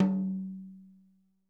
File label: HITOM, drum